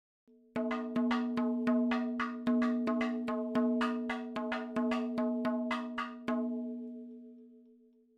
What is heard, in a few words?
110 7 8 MADALAM FILLS, percussion